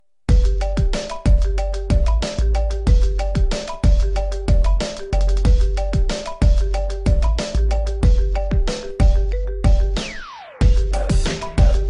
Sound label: iphone drum mix ringtone